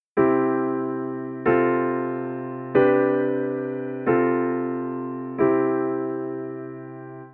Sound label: Tonic pedal V I soprano motion